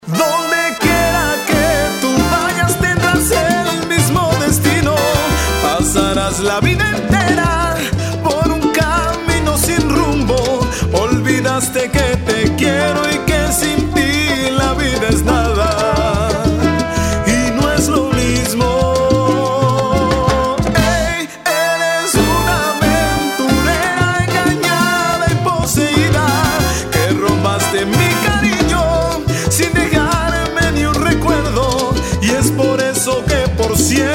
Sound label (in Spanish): Salsa